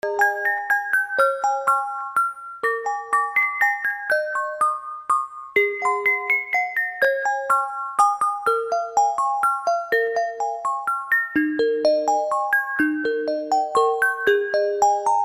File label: music box; holidays